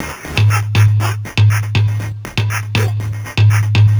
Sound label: 120 Perc, Drum Loops; Abracadabra; 120bpm; Nu Dance Electro